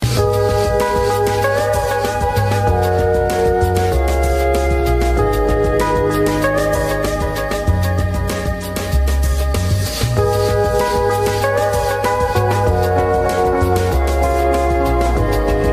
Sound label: iphone ringtone; iphone 5s